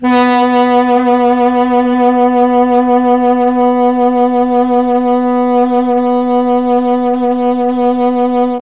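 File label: B2 tenor sax, mellotron; CHMB TNR SAX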